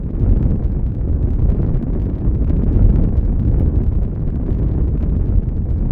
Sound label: Small Fire; Nicktoons Nitro; arcade